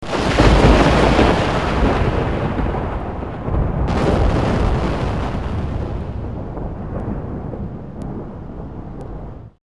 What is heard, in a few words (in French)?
tonnerre; SONNERIES BRUITAGES